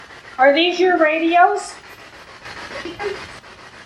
Repeat radio, Radio Room

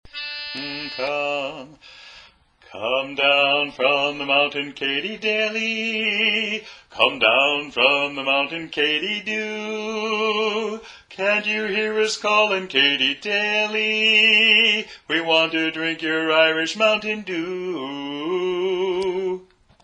Bass; Katie Daley

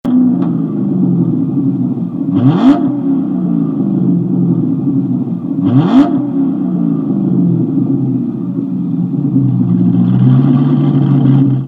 corvette

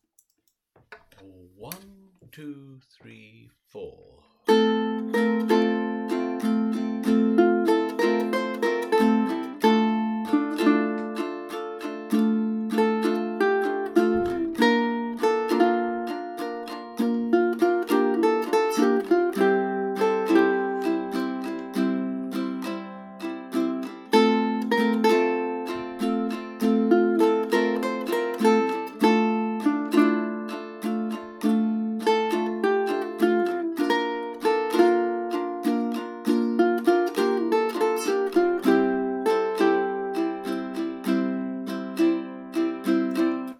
Riff

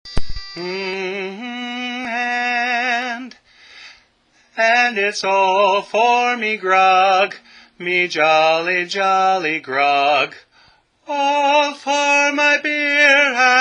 All for Me Grog (Lead)

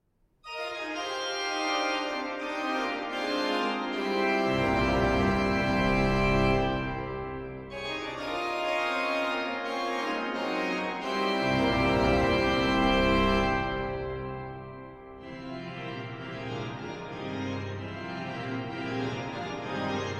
Toccata

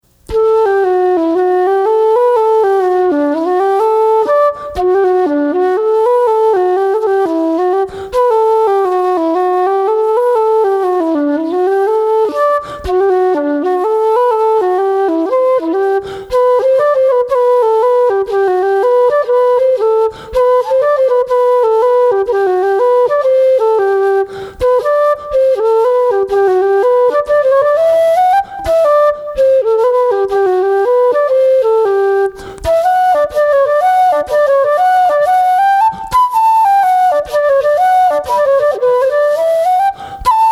Reel Slower

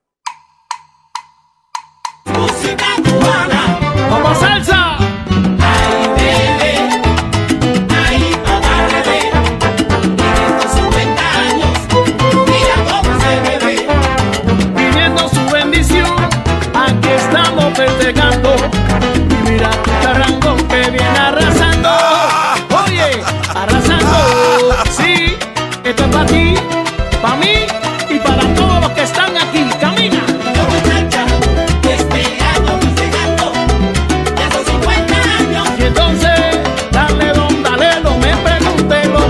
Clave

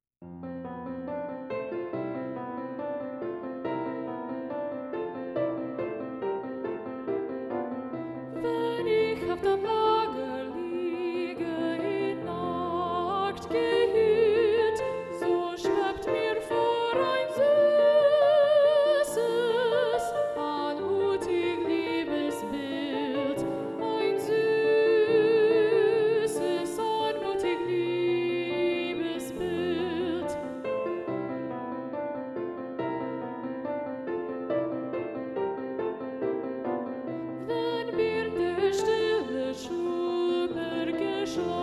Abendlied SA - Soprano Muted

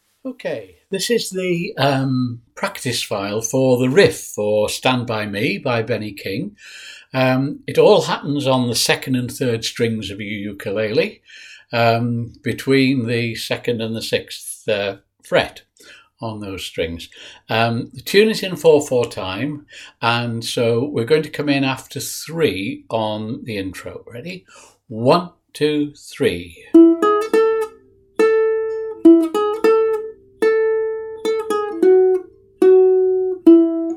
Riff Practice File

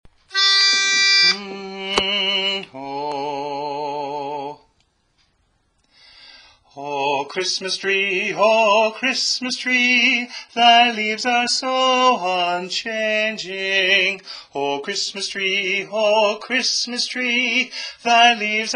Lead; O Tannenbaum

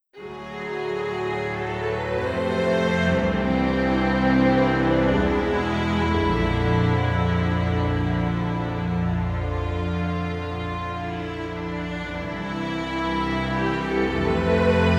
Theremin; Firebird Theme

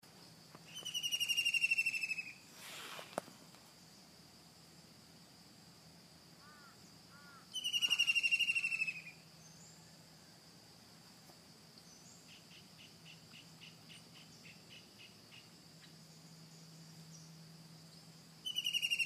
White-throated Kingfisher - Halcyon

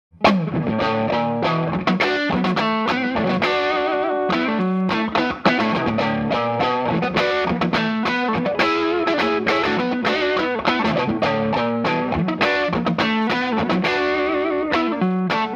Texas Strat Dirty

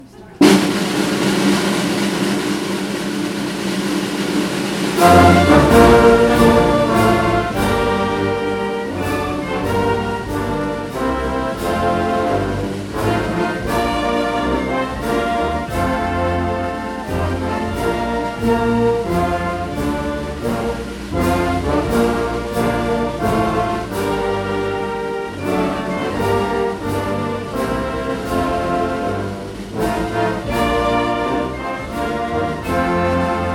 National Anthem

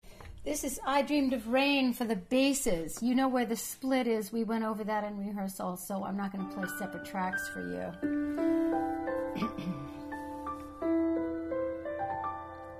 Bass

